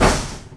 DOOR, INDUSTRIAL; Roland - LA Composer vol. 1

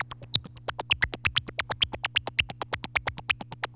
TEK NOISE, SYNTH SEQ

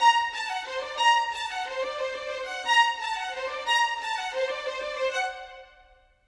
Violins, Orchestral; Sonic Foundry (Sony Creative Software); Orchestral VOL-2; Modern 07

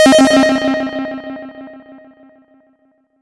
fx dub siren delay; M8; M8JUNGLEPAK1

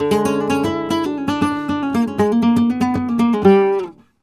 Remates De Pulgar, WAV Guitar, Flamenco; ProSamples vol.46 - Flamenco

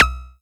percussion; FutureRetro FR777

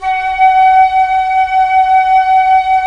Flute, Roland LA Composer

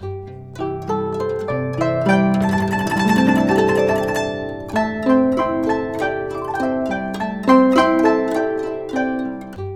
GUCHIN 90 C; INIS - Opium; GUCHIN 100-C

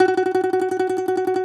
DOMRA, Plucked Strings; E-MU Producer Series Vol. 7 – Old World Instruments

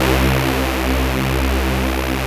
PULSA C1, LEAD SYNTH; Best Service Alpha Dance vol.2

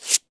SANDPAPER; AKAI S-Series CD-ROM Sound Library VOL-5

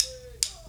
stick, drums; mercure drums

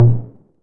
808 MT Tape Sat, Tom; Goldbaby Tape808 & Tape909

